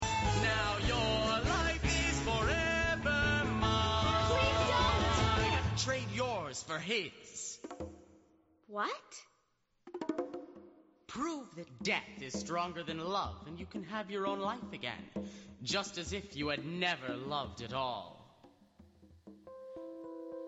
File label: Promises Ensemble All